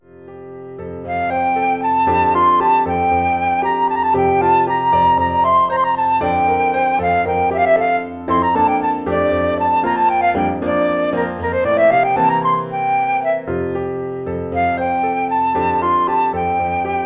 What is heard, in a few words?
flute solo; flute solo + PA